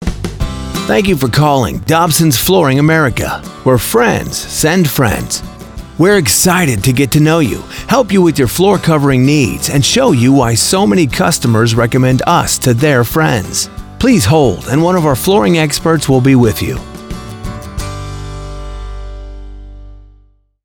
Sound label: On Hold